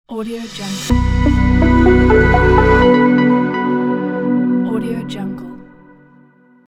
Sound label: technology logo